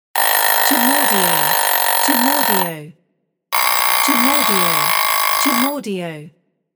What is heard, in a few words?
Ringing